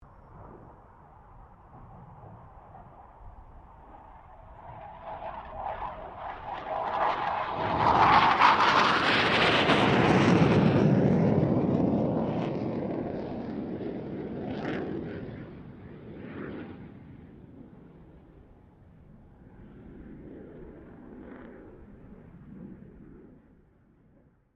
BAE Hawk 127 pass; Airplanes; Sound Effects:Vehicles

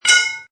Metal Pipe ding; Metallic Sound Sound Effects

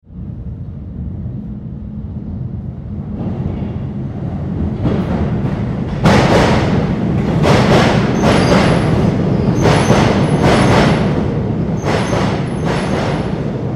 Train Subway Underground Up and stop; Subway Sound Effects

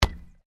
Football bounce wood, Soccer Ball Bounce On Wood; Sports

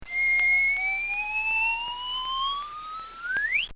Cartoon Sound Effects Rising; Cartoon Sound Design

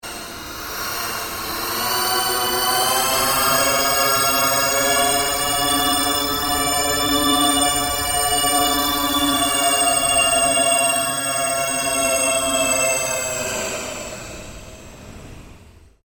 Ambient Drone Ghost Chatter Scream; Sound effects created by manipulating other sounds; Scary Soundscape Sound Effects

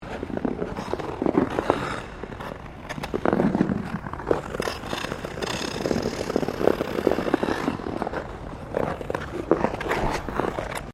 Ice skating Maneouvering, Figure skating

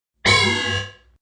Alarm Hit; Multimedia

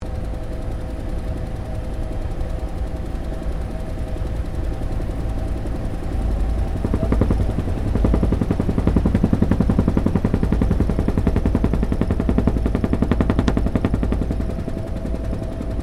HELICOPTER BELL UH1 IROQUIOS FLYING INTERIOR; RAN UH-1 Iroguios; Helicopters (Sound Effects:Vehicles)

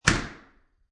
Door Slam Shut

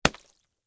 Splat Wet Drop, Sponge; Texture; Drops (Sound Effects:Objects); BlastWave

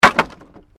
Wood Drop; Material components for constructing more complex sounds; Sound Effects:Materials

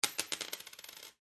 Foley Coin Drop On Counter Short; Money; Objects

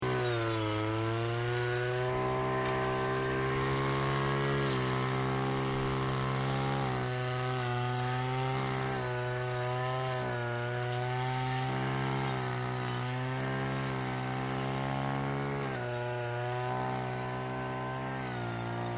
Whipper Snipper Metal Disk Operating Close Loop; Hedge trimmer; Yard Tools

